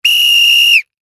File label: Sports Whistle Blow Hard; Whistles; Objects; SFX Bible